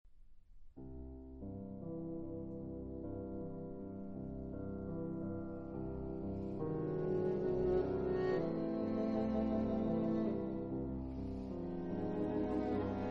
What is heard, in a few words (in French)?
Quatuor piano